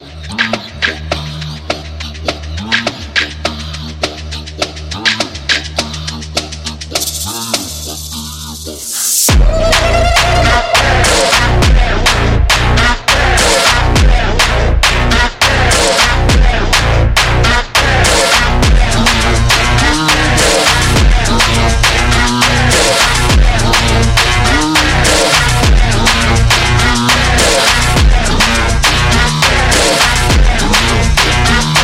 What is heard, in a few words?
Phonk Music; فانک phonk